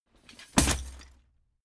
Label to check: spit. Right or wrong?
Wrong